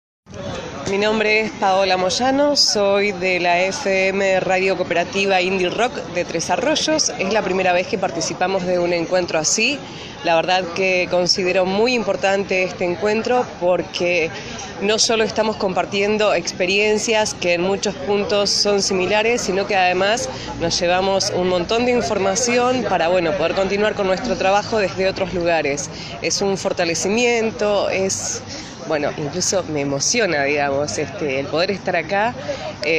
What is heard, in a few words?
indie rock